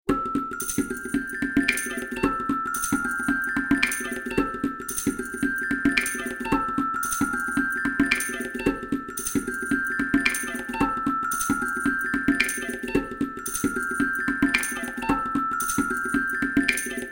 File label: A 112 Percussion Groove